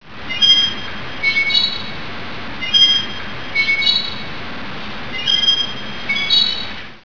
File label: squeaky pillar